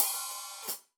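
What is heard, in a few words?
Sab Open Hat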